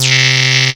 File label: CREEPY C2, sequencer; Best Service Alpha Dance vol.2